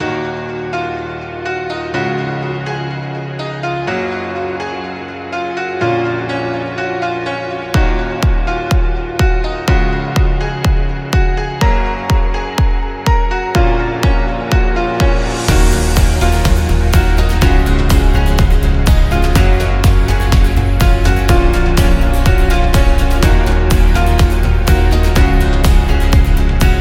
Motivational Epic, corporate music; corporate music vol 22

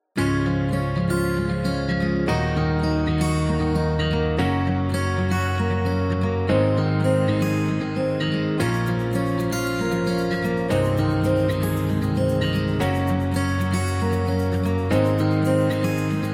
Cinematic Indie, corporate music; corporate music vol 19

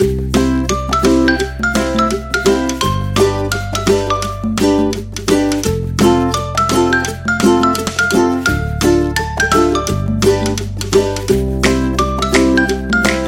This Is Funny, happy music

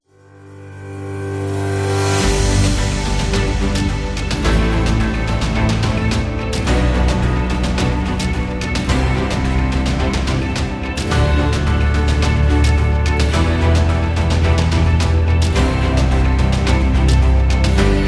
Inspiration Rock

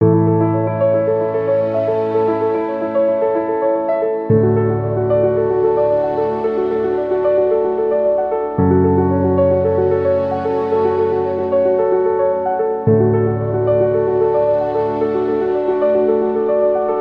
Positive Chill, corporate music; corporate music vol 21

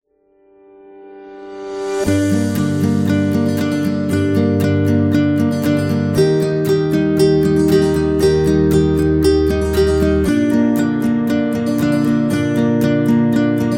The Guitar; happy music vol 10

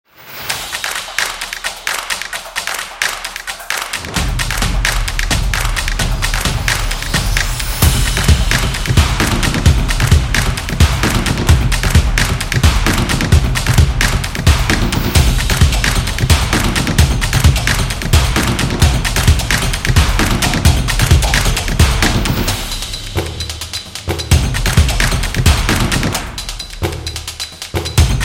Energy Beat

